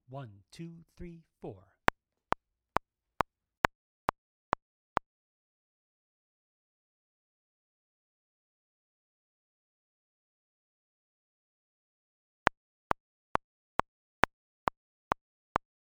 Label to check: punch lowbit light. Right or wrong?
Wrong